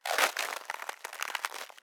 ambient; World of Tanks

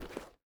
ui pickup rubber; Rust

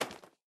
book pickup; Escape from Tarkov

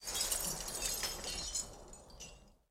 explosive glass layer; War Thunder

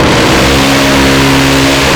Automatic Phalanx, Weapons; DCS

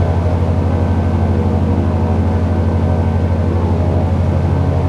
an2 cockpit, engines; War Thunder

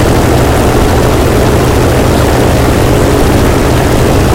engine bomber rpm close; War Thunder; engines

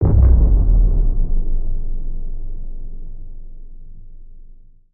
start battle; World of Tanks Blitz; GUI

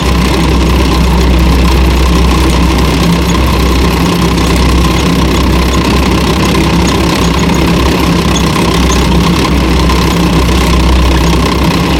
tracks light speed 99, tanks; War Thunder

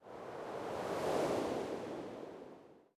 ambient port default; World Of Warships

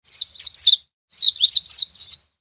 Amb Animals; Red Orchestra Ostfront 41-45

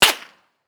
bullet flyby pistol; Rust

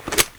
Inf Weapons Foley 3rd; Red Orchestra 41-45

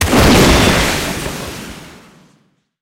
tank rocket shot; cannons; War Thunder

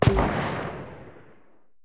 grenade launcher far

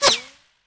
bullet whiz; Escape from Tarkov

